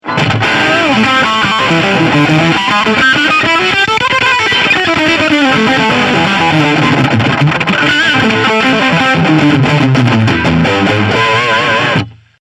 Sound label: Tweak Fuzz